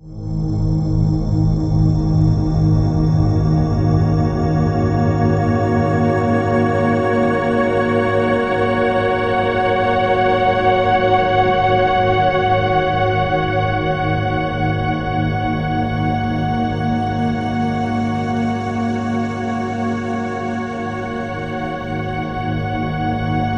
ARPEGGI L, Ominous Drones; USB Soundscan vol.48; AKAI

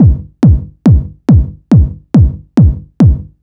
Kick, Trance, Drums

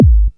50HZ SY KICK, SYNTH KICKS; Best Service Alpha Dance vol.2

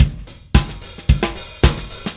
Drums Fill, Indie Rock; Session 01 - Brighton; Sonic Foundry (Sony Creative Software); Indie Rock Anthems

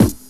Kick, One Shot Drums

